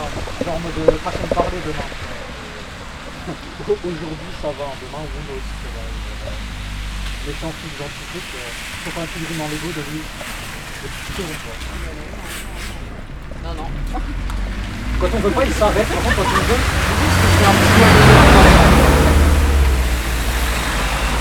Fred se fait engloutir par le bus sous la pluie; place leclerc

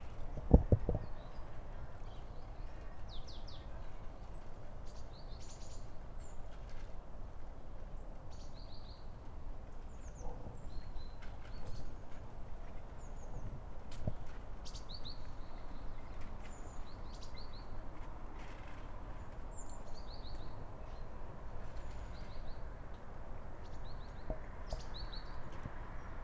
Arret de bus; square coluche

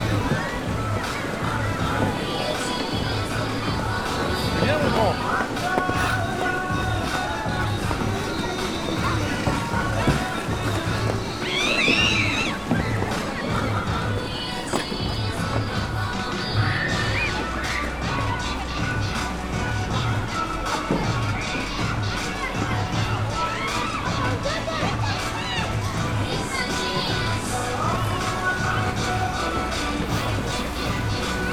enfants haut parleur; centre ville

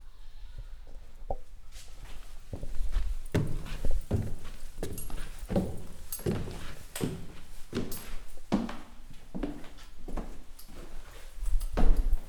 escalier, appartement

